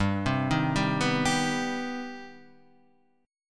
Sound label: C Minor Major 7th G